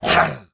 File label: wave damage; Seer; GamigoNA